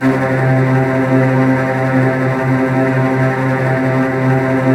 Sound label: STR TREMOLO, Strings; ILIO; Kirk Hunter Virtuoso Strings VOL-3